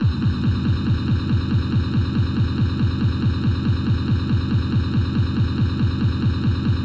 Break 140 bpm

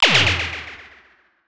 Superkick Fx

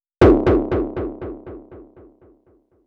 original kick echo

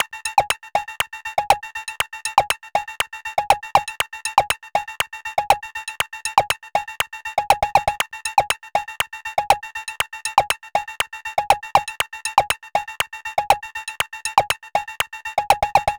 Percussion

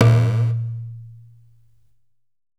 darbuka FX

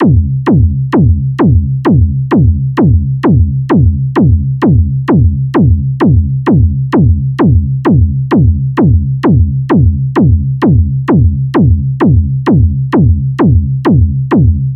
orgnl kck 130bpm

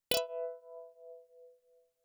GUITAR ECHO

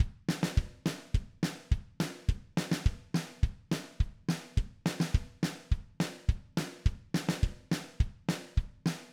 basic acoustic

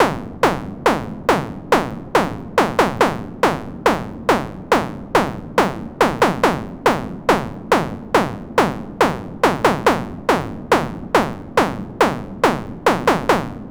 Hardstyle

